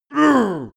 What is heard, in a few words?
male hit uh, schreie